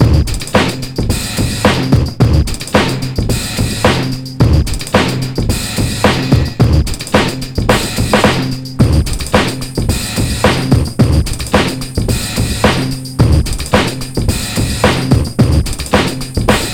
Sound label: HIT THE BREAK 109 bpm; Planet of the Breaks